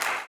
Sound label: CLAP, blues & soul; ILIO; Vocal Planet